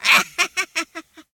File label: Zombie Laugh; Zombie Catchers; Android